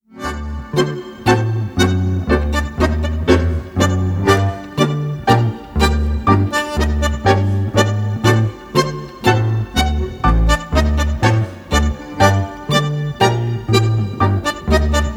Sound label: tango orchestra